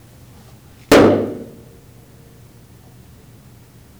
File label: drum